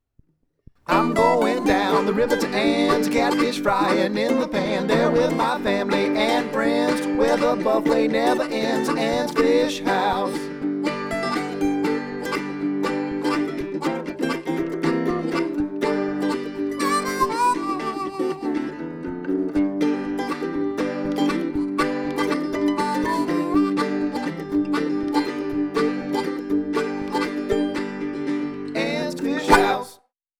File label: JINGLE acoustic mix; WKXY; ANNS FISH HOUSE